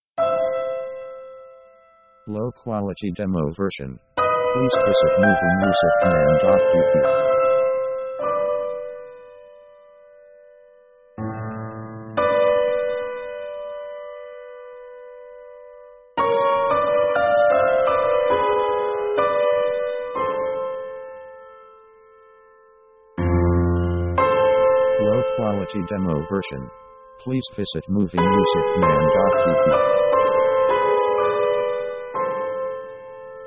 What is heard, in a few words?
orchestra piano dramatic; orchestra themes